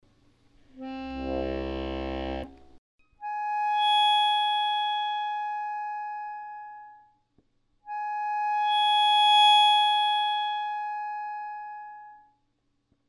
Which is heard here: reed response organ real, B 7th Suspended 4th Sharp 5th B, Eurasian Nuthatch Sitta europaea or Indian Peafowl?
reed response organ real